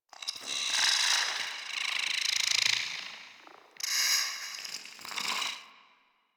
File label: Tech One Off; Warframe; Sacrifice; Vitruvian Memory